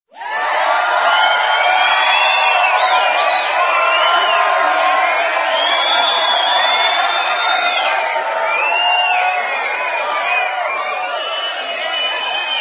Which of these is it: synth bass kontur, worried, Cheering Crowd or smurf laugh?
Cheering Crowd